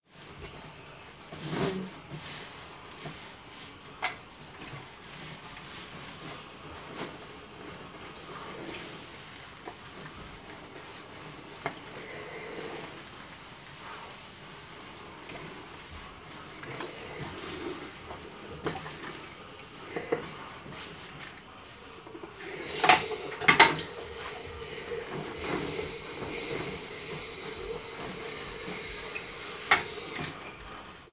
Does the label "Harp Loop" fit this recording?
No